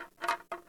energy drink; STALKER; IWP

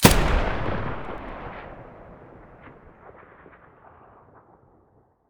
muzzle sa80 mono

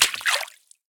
pistol drop puddle; STALKER; IWP

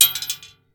Shell 39mm metal; STALKER; IWP

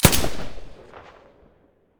silencer ump45 stereo, weapons

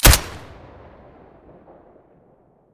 silencer colt1911 stereo

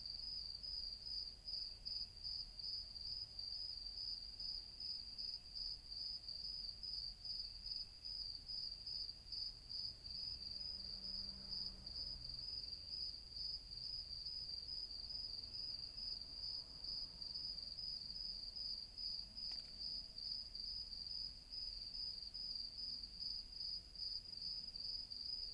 bkg night, ambient background; STALKER; IWP

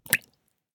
shell 12ga water; STALKER; IWP

